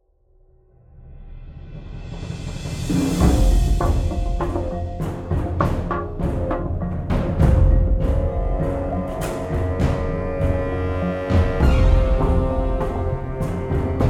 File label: Tense; Star Trek Resurgence